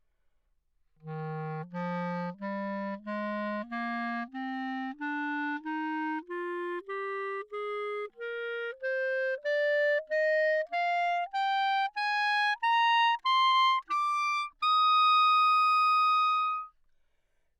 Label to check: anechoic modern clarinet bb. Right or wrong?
Right